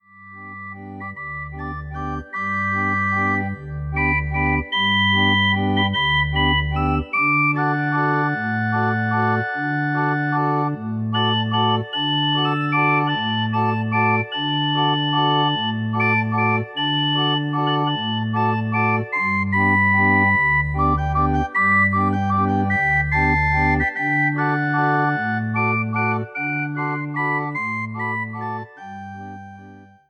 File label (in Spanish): Organillero